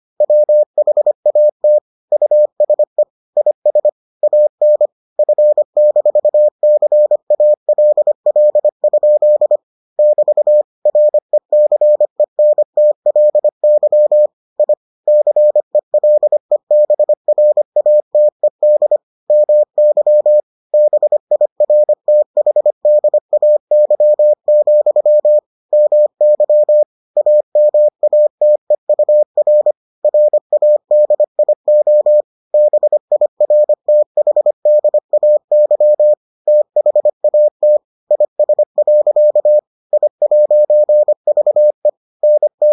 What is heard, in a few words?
morse